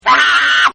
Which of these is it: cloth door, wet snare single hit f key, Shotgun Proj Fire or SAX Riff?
SAX Riff